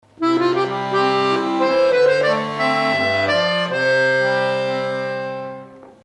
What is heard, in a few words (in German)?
Akkordeon